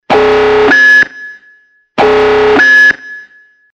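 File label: sound alarm; electronic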